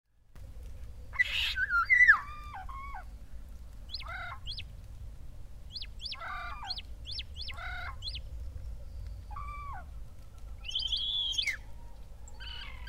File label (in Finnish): kottarainen